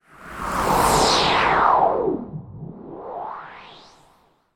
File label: sound design transition filter pass sweep delayed